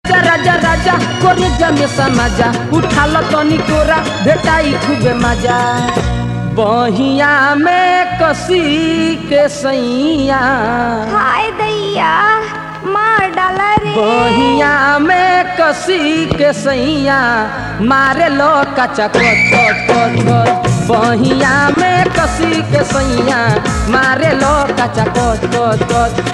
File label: Bhojpuri